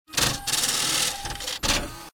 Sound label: print, star cores